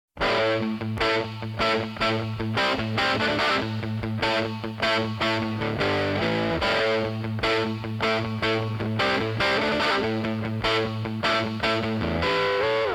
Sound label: music